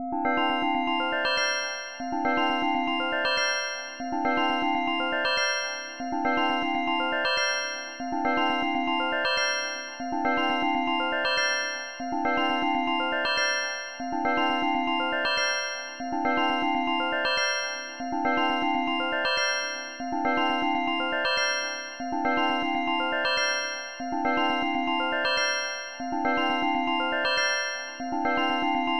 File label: Bell Chime